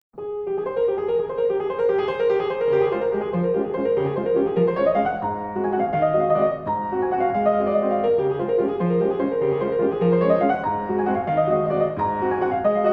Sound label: waltz in d flat major